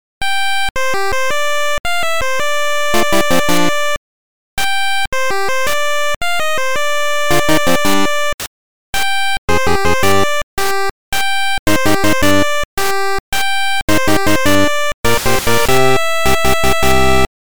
8bit fanfare

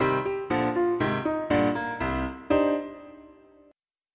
game over

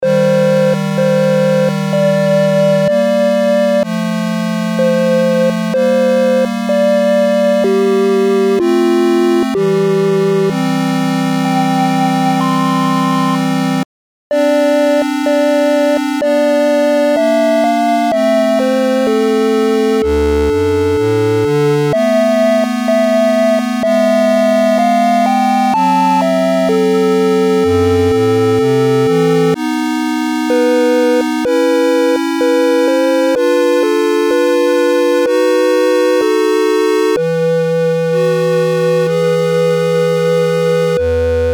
8bit

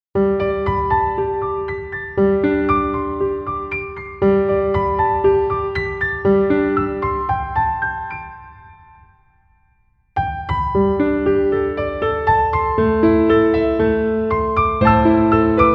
piano; yuuyake ikka

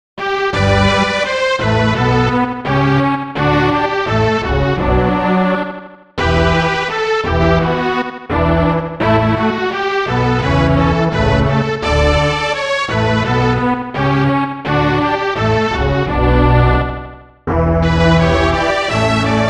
16bit Loop; kizoku shiro

